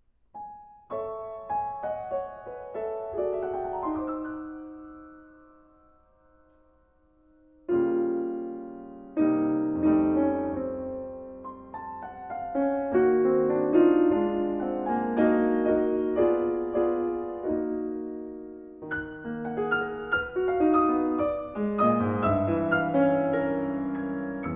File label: Solo Piano